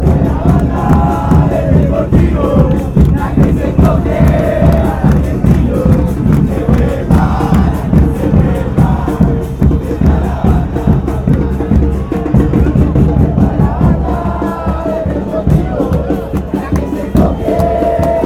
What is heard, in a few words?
deportivo merlo chant